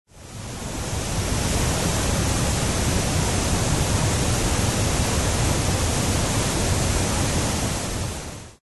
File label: sfx waterfall; Шум воды